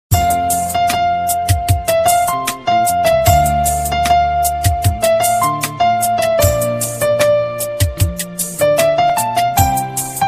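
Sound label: instrumental ringtone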